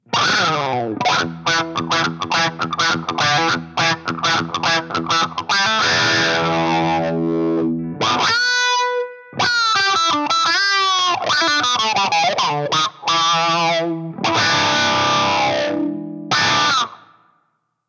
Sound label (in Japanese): Drive Attack フル